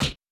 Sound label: Small Hit; Super Smash Bros